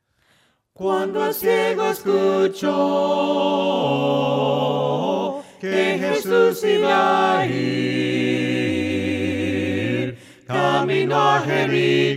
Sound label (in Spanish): cantando sin instrumentos